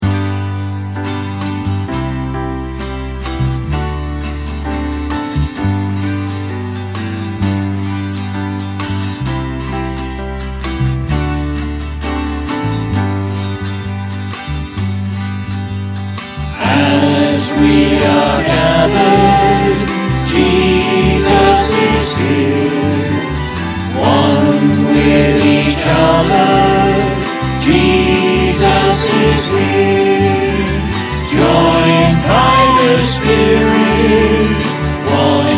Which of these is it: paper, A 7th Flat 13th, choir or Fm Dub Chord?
choir